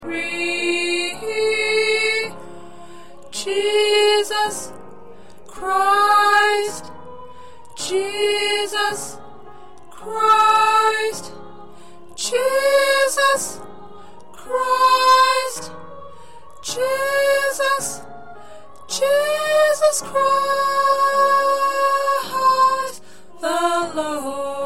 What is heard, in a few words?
Soprano, choir